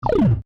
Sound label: chime